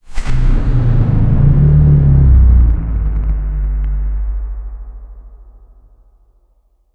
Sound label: cinematic fx downer sound